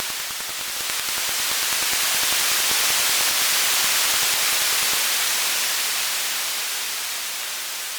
ambience sprinkler

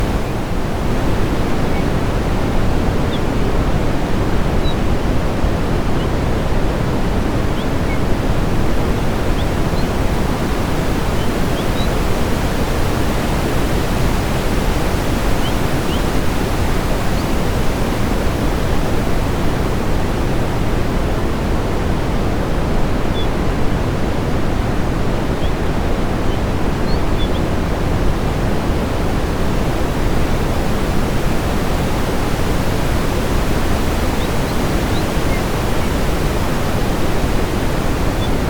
ambience street day

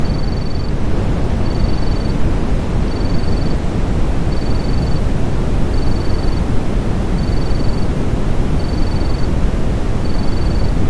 ambience street night

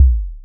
sfx bass; vinylgod

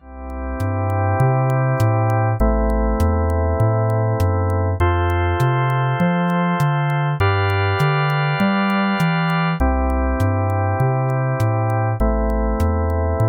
music gig covers

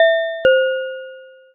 doorbell

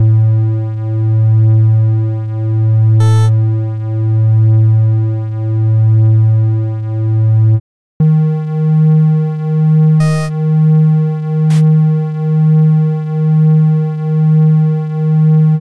eerie; mrpquest

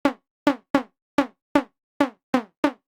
dog bark fence

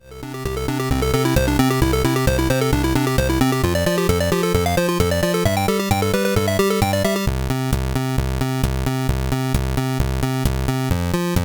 music arcade

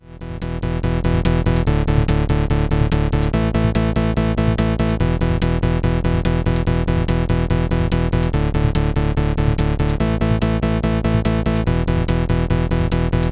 music pubrock live

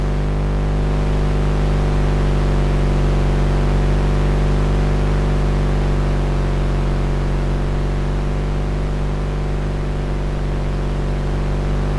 ambience mower distant

